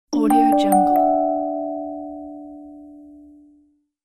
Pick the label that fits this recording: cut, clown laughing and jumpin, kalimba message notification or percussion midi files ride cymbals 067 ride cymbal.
kalimba message notification